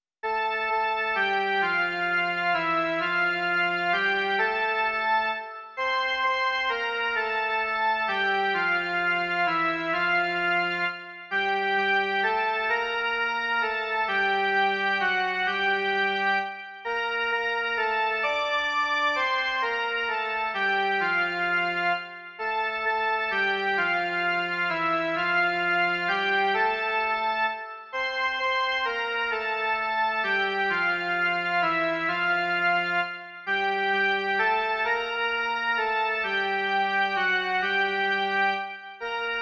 jen melodie; Ve všem jsi ty

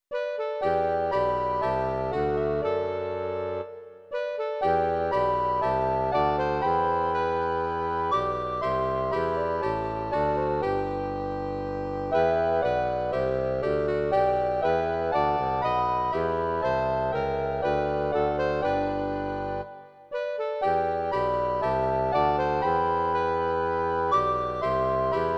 2 okaríny, klarinet a kontrafagot; Imaginativní verze

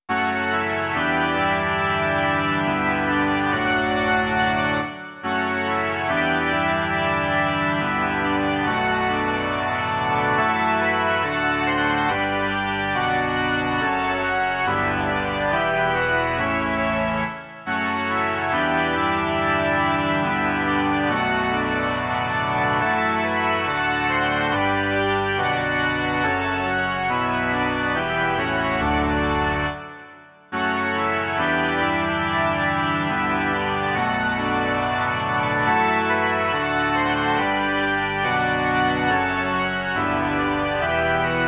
varhany; Dej mi, Pane, bdělé srdce

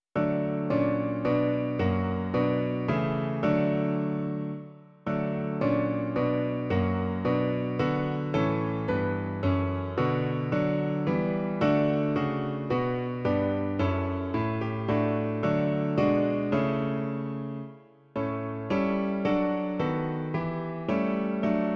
klavír; Každý den začínám; Klavírní verze

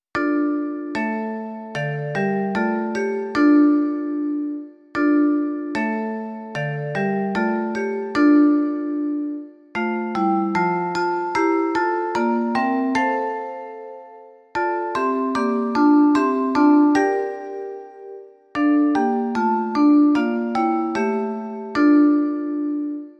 xylofon; Vládce světa, Bože náš; Imaginativní verze

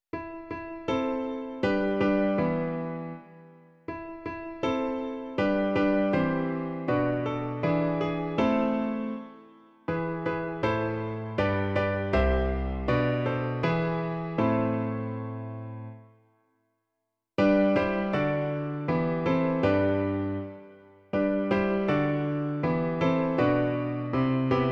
klavír, Klavírní verze

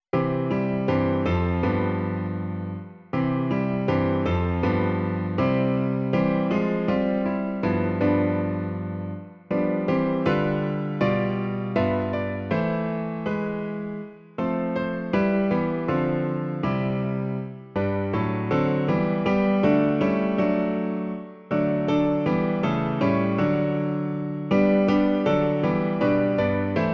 klavír; Vizme pacholíčka; Klavírní verze

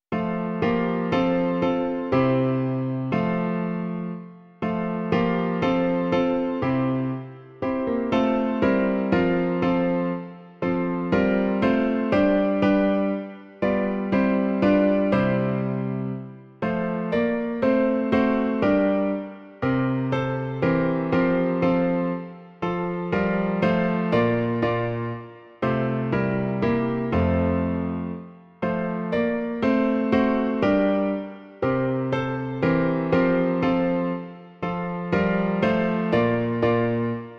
klavír; Dej, Otče milý; Klavírní verze

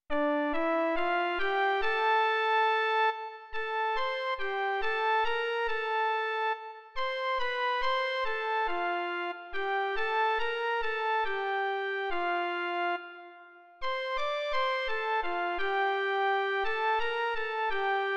Chléb živý, Bože jen melodie; Doprovody Farského liturgie

